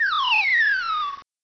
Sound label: die